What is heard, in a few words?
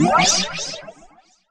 zap